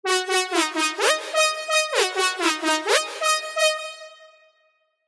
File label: Sylenth1 dirty dutch leads; Dirty Dutchess; revealed sylenth1 dirty dutch leads vol 1